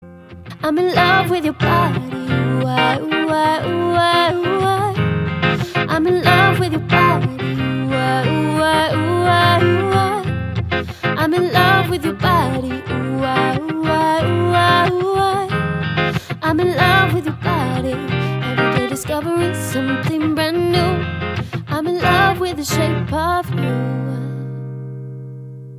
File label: acoustic